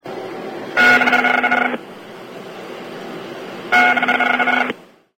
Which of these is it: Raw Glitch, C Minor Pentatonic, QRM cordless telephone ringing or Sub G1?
QRM cordless telephone ringing